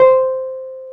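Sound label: piano Wurly; PNO; Roland LCDP10 Keys of the 60s and 70s 2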